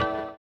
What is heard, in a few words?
orient, bizarre guitar; Spectrasonics